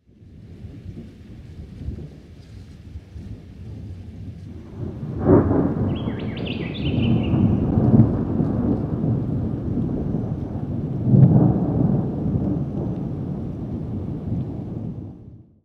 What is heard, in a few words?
rufous whistler stormy night